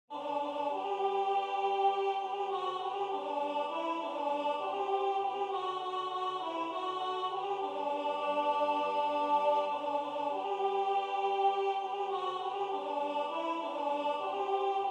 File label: African Song Alto; Siyahamba; Concert « Amour et Traditions »